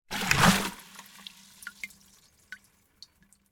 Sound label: Jug Shake Water, Open, Lid, Slosh; Foley; Shaking Sound Effects